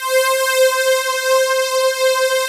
PADS; Pro-Rec - Synthsonic; Z1 PADS

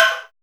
DWEEB PERC; TEK PERC1; Drums In Your FACE!

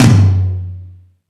Toms, E-MU Pro-Cussion

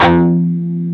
PRS PWR F#3E, Guitars; PRS PWR CUTS

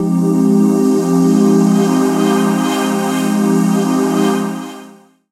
Uplift Pad

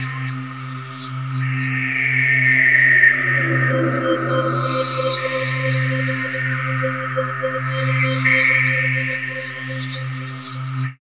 pad c, Chillout ambient Synth Pads

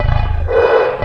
MACHINES

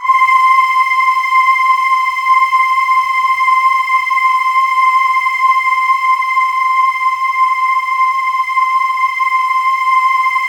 C5, Synth Leads

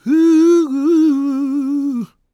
GOSP MALE, SOLO; Spectrasonics; Vocal Planet

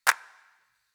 Clap, Drum Percussion Single Hits

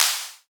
Clap; Drums; Claps; Best Service; House Definition VOL-1

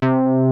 MINI BOUNCE, MINIMOOG

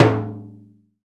CNTR STRK, Timbale Battery

